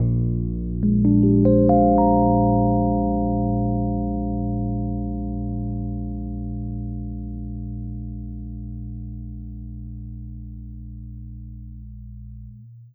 E. Piano

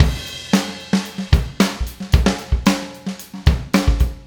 Acoustic Drumloop

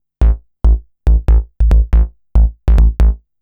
Synth Bass

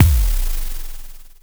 Kick, Drums & Percussion One Shots Drums

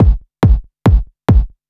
Dance Drumloop; Drums & Percussion

